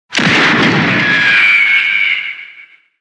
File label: A357 Magnum Python